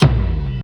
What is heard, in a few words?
GLISS TOM; Greytsounds; Scott Peers Sound Engineering